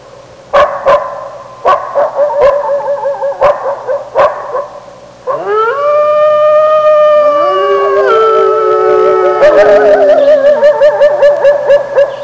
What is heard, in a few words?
Wolves barking and howling at the moon